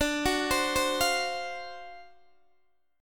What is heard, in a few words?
D 7th Sharp 9th, Guitar, DADGAD